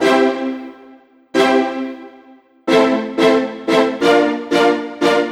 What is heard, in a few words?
Stacc, strings